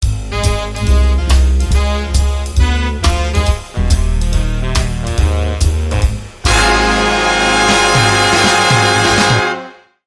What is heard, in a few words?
Swinging Blues, Sting